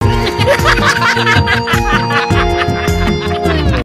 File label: Nepali Men Laughing